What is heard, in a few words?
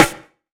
snare, Hardcore Breakbeat